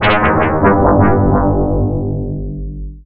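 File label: ring pattern, sequence; 10-sequence; nyquist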